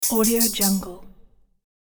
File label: ui future menu